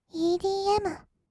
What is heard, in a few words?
EDM; 音楽ジャンル; 1200 kawaii voice!!